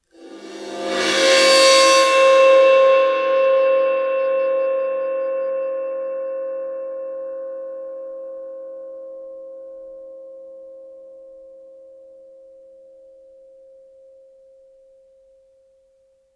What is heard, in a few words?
crash bow, Suspended Cymbals bowed; Percussion